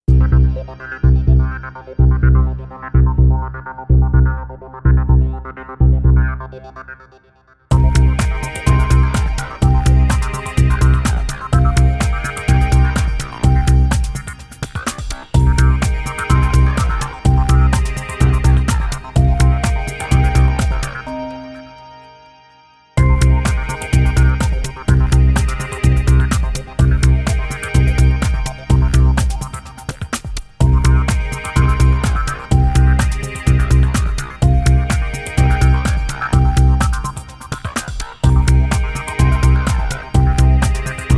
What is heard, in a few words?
Minimal Fiber Loop; Network Production Music; NM288 Tradeshows